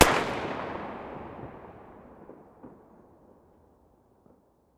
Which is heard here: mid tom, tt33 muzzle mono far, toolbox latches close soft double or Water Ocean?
tt33 muzzle mono far